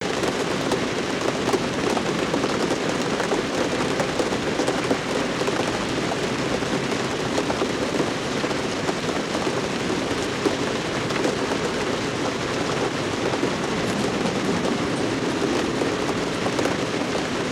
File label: Rainy Traffic